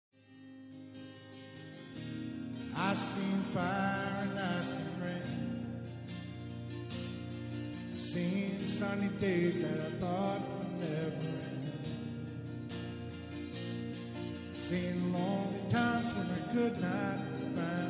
singing

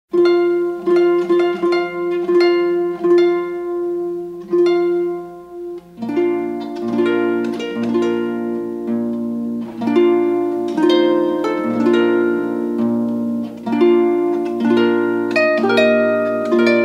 Bridal March, harp; Wagner; Mendelssohn

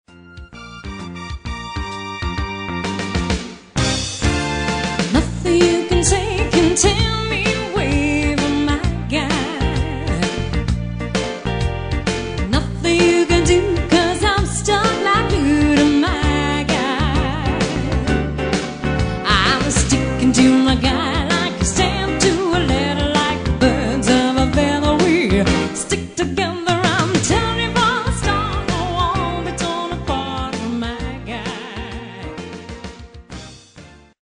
live band

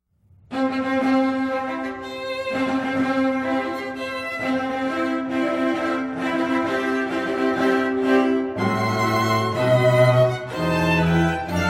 Wedding March, String Quartet; Classical; Mendelssohn; Heritage String Quartet

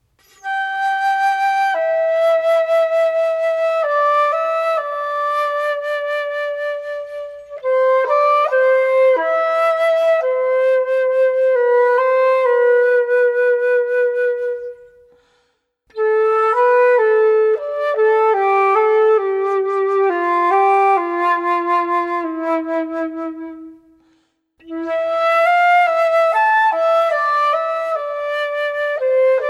solo flute; Clair De Lune by Debussy; Christen Stephens

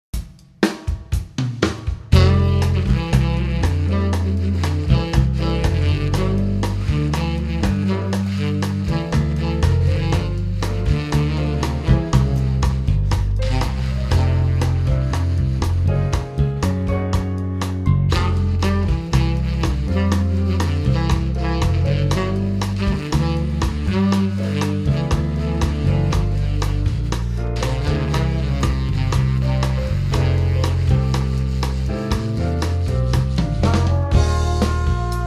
quintet

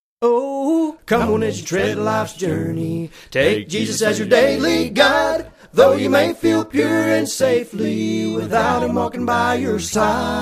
Bluegrass Band; Jordan

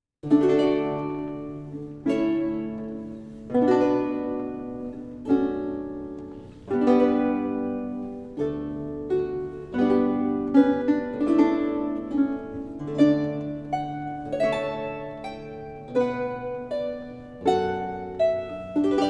with harpist, acoustic guitar; Canon in D; Johann Pachelbel

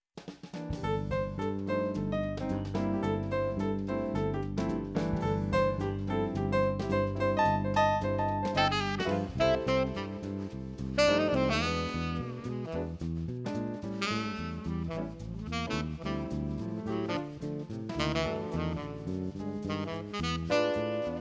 Swing; Live Band; Hotlanta Dixieland Jazz

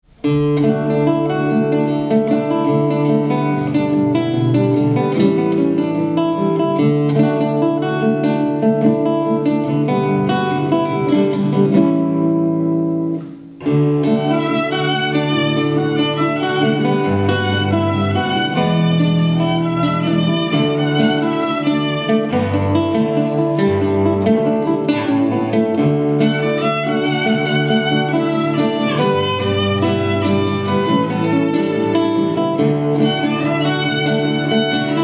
String trio

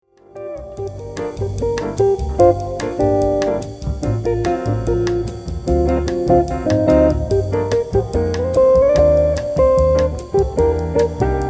Latin Jazz, guitar

